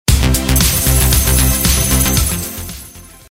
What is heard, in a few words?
big win